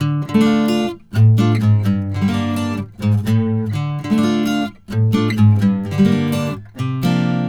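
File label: Acoustic Latin Guitar 128BPM D minor; Vengeance - Essential Clubsounds Vol.4; Guitarloops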